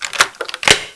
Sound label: key unlocked; XDM; arctic incident